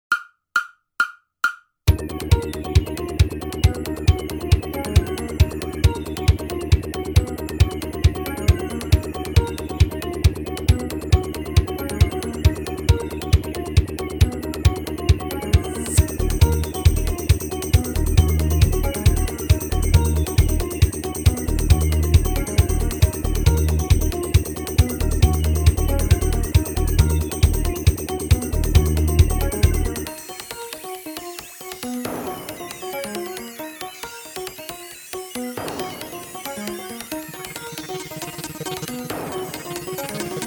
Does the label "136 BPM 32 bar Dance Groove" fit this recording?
Yes